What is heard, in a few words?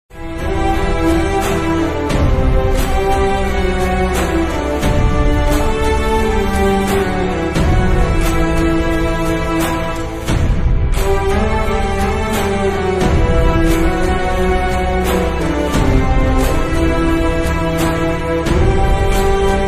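sad bgm ringtone violin music; salaar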